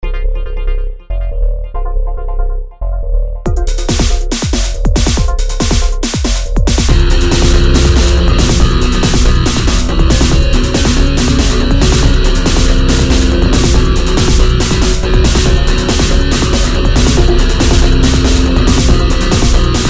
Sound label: guitar